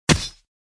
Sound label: flesh hit; tacz; CIBR GunsPack v0.1